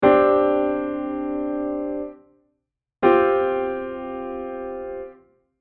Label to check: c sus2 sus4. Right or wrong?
Right